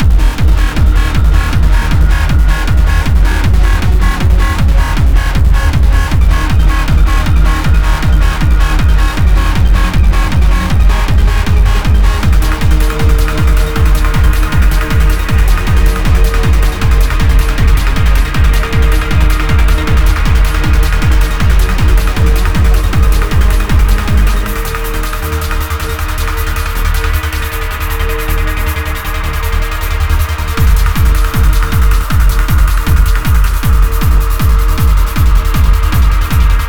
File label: Alarm; deorbit 2015